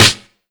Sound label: airy snare sample d sharp key; Duration 0:01; snare drum samples